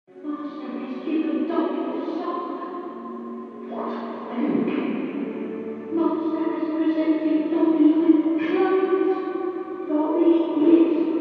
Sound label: Second Floor Overlook; Porter